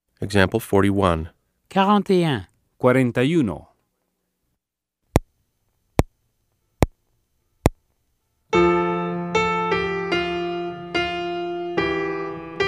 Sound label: Keyboard